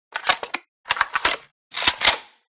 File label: saiga reload, guns